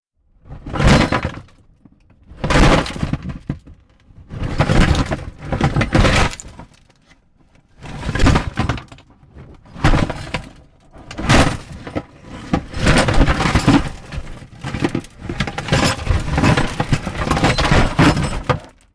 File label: small crashes